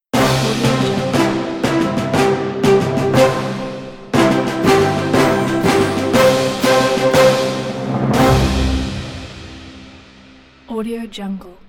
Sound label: orchestral fanfare ident